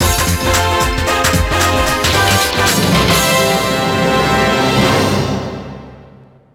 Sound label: Race Goal Fanfare; Mario Kart Arcade GP 2